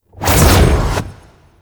Teleport Jump; Enemies; Abilities; Warframe; Tau; Dax Ninja

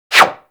Bullet Parry Metal; Impacts; Bullet Parries

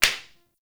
Plastic Broom Drop; Warframe; Ambience; Nineteen Ninety Nine

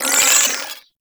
Flare Impact; Warframe; Sentients; Sentient Trooper

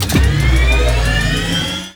Weapon Three Charge; Warframe; RailJack Weapons; Modular Weapons

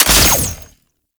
Sniper Low Clip; Warframe; Sentient; Caliban Prime

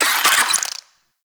Infested Ashen Vocal Pain; Warframe

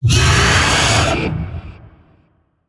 Vocal Pain; Dialog; Warframe; Zariman; Void Angel

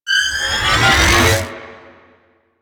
Space Fighter Elite Laser Warp Start; Space Fighter Laser Agent

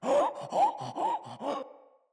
Infested Runner Breathe Quick

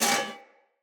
Foley Armour Step K; Enemy; Mockeries

